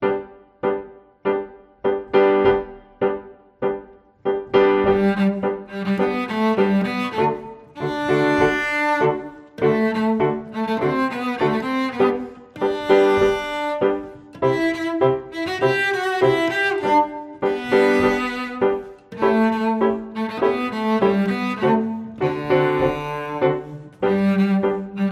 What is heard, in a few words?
Tango